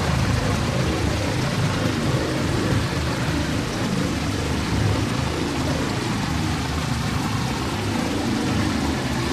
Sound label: generator ambient loop healthy; coop; Titanfall 2